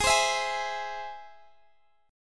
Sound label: G sharp Augmented, Guitar, DADGAD; x,x,18,17,19,18